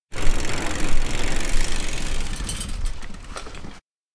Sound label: bike stop; anemoi